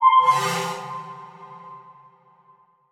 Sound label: Transition Metallic Hit To Whoosh; Production Element